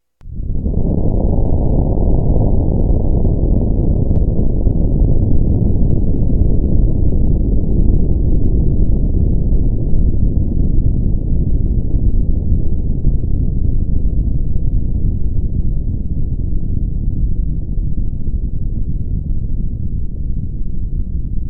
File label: Nuclear Bomb Sound